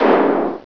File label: quake; Populous Demo